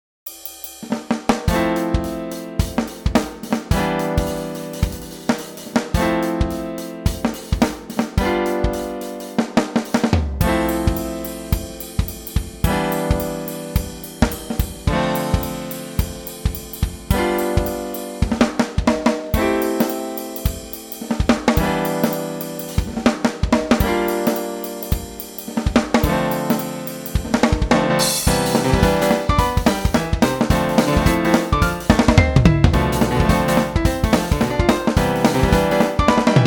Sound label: Jazz